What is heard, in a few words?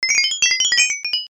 medal